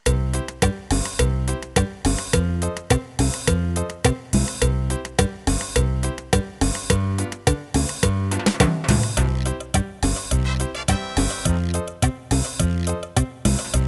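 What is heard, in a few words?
Cumbia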